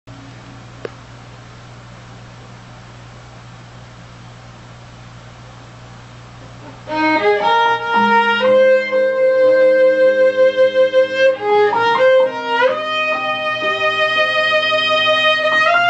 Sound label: The Rain Violin